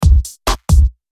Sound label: Beat